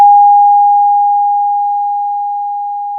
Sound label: Sound of a school bell